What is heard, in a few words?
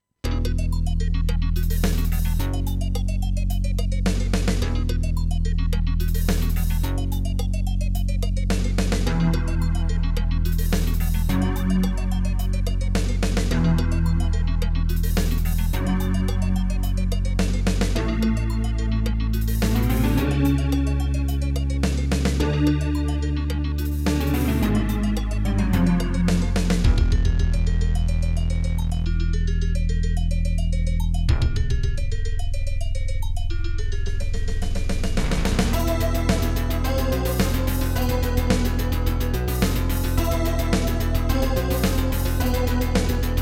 SC880; Dune 2